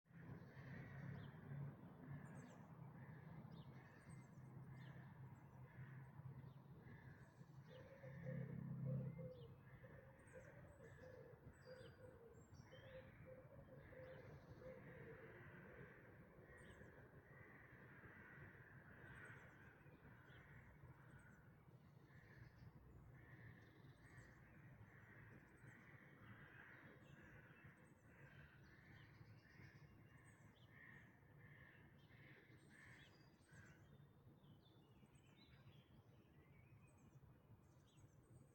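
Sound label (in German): Dawn Chorus, Vogelgesänge vor Sonnenaufgang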